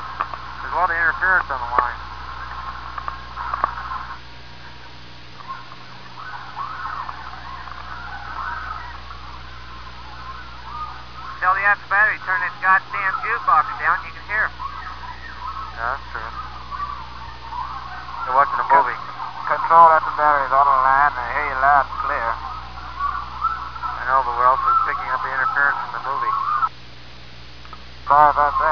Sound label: interference; There's a bit of interference coming from the movie in the afterbattery; sound powered phones; Caiman; chatter on the sound powered phones I believe right before a snorkel evolution